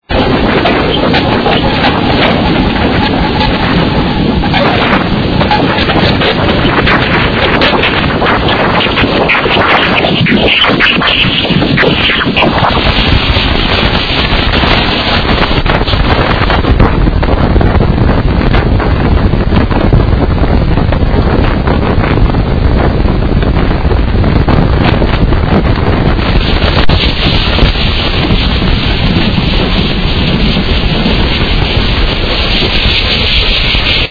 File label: electromagnetism of io; nasa space sound recordings